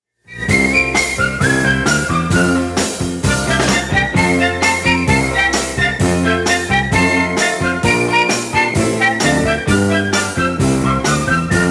whistling

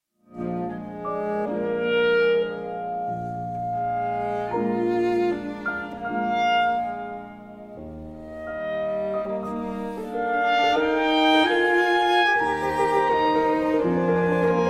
trio in B flat major for clarinet cello and piano; ludwig van beethoven

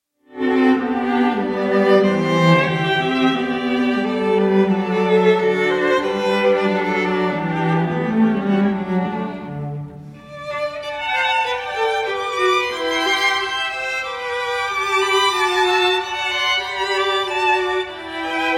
string quartet no 2 in g major; medici quartet; camille saint saens; op 153

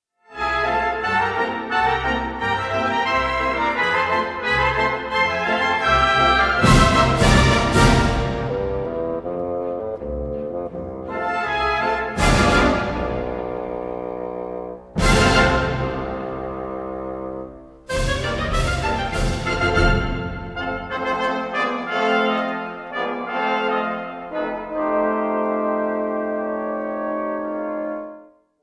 comic polka for bassoon and orchestra; der alte brummbar; op 210

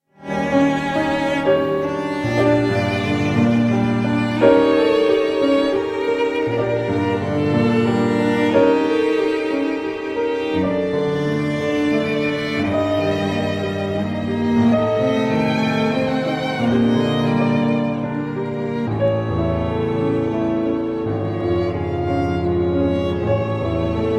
trio for piano and strings in g minor; Ernest Chausson

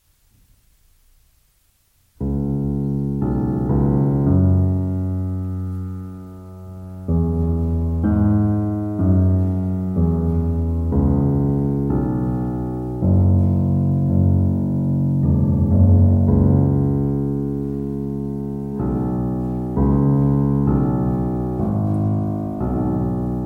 pianotrio; ravel

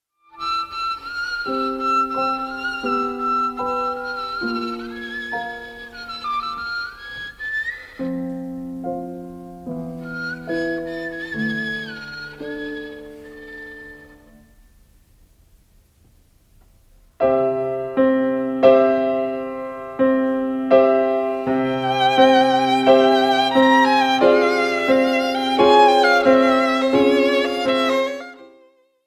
six romanian folk dances for violin and piano; sz56

